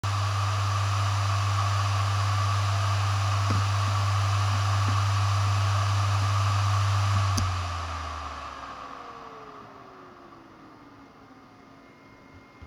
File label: Hard Shutdown, Seagate BARRACUDA ST4000DM000; HDD